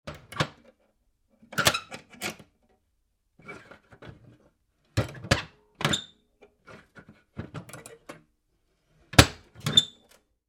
Stove Door Metal - Wood burning cast iron door open and close various; Wood burning cast iron door open and close various movements; Fireplaces; Tom Hutchings